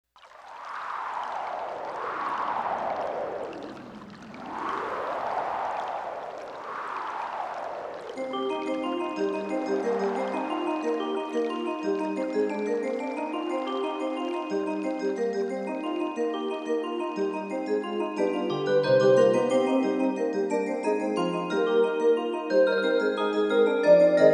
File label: Bell Tune Start; This is not really a song, but rather, what I call a musical doodle.